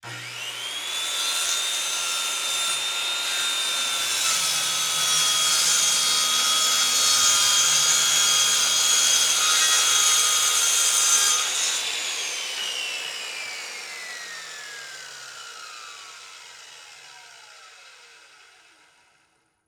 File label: Saw, Circular, Porter-Cable, 315, 7 1/4 inch blade, 16 tooth, On, Cut, Plywood, Off; Tools; Airborne Sound